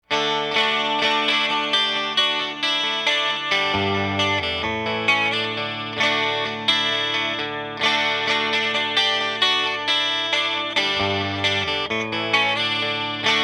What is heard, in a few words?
ric treble boost; gemini; comprossor